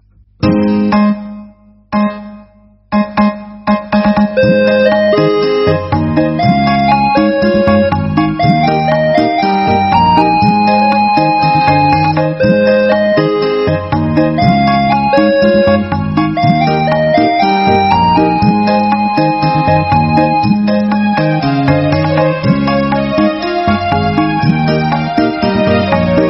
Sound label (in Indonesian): nada dering es krim dangdut